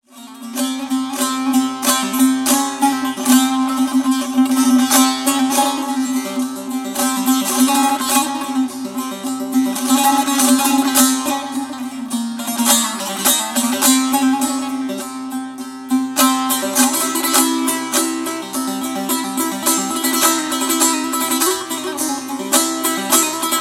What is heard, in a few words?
Tanbour Solo